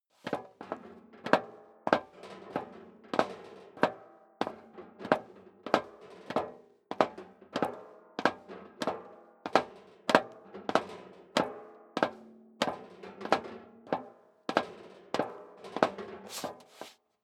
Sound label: step leather shoes metal rattle downstairs sequence; schritte